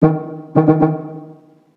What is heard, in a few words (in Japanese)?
ダンプのクラクション